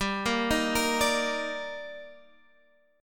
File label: D sharp Major 7th G